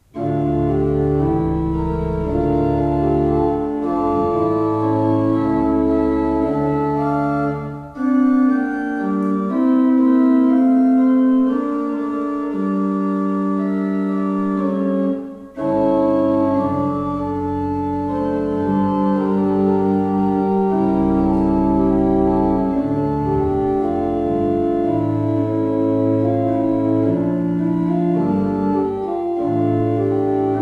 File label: Ott, pipeorgans